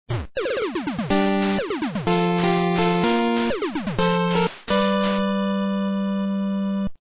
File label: win; bugs; svencoop